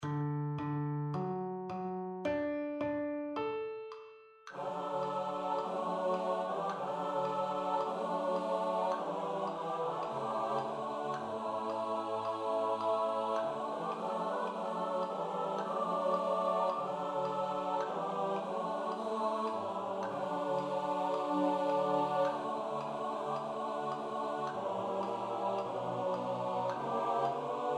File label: SATB